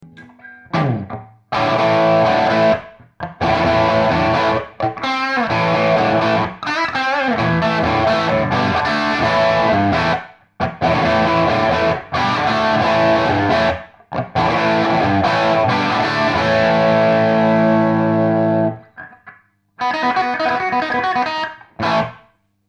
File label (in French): JMP entrée I Squier 51 position chevalet SH1